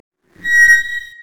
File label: brazier chains groan